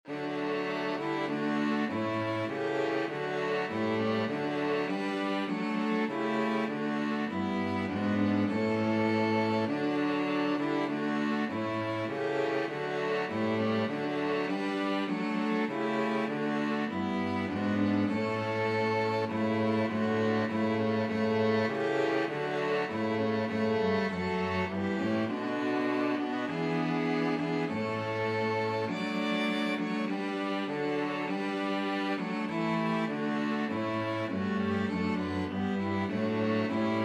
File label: glorious things of thee, string quartet